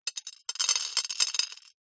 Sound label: household pills tablets handful drop on glass; zapsplat